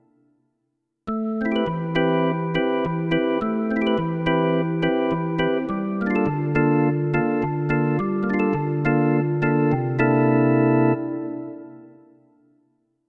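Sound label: Perc Organ, digital piano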